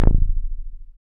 Dance Bass; Sound & Vision - Gigapack II CD 1

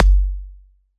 kick layer

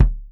KICK, Salsa Kit; Drums&Percussion; E-MU Producer Series Vol. 7 – Old World Instruments

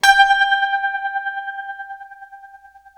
TREM G 4, Guitar, TREMELO; East West; Steve Stevens Guitar Samples Collection

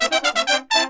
120 AM L, BRASS SAMBA; Best Service ProSamples vol.14; AKAI

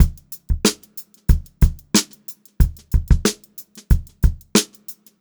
BEAT, Funk-Groove Drumloops; USB Soundscan vol.38; AKAI

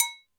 Md Muted, Alesis D4 drum machine

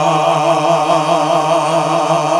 GOSP. AAAHH

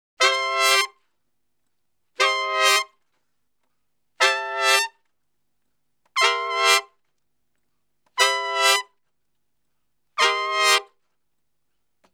Salsa Picante; Masterbits; World Zone VOL-3

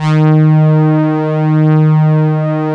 STRINGZY D#2; Strictly Synthe3; E-MU Producer Series Vol. 6 – Analogia Project

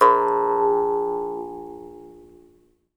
Jaw Harps

